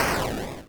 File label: Boom